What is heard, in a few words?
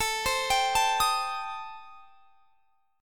A Minor 7th Flat 5th A; Am7b5; arpeggiated; x,24,22,24,22,23